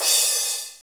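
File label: CYMBAL 03, Eurotech; Sounds Good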